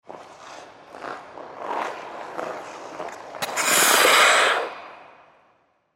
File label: Ice Skate Approach; Backwards Slow Stop Snow Plow